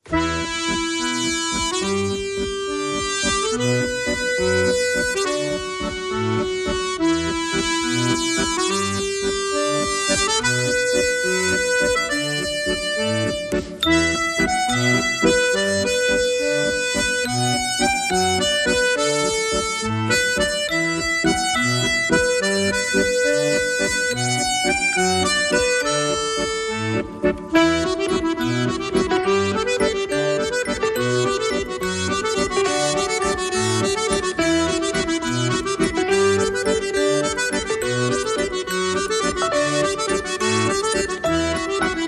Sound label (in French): Valse Nuptiale